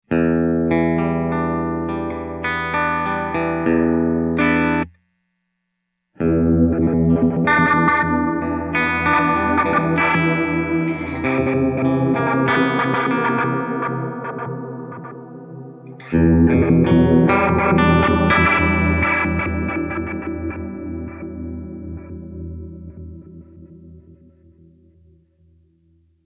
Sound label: Glitch Dark; reverb; Beer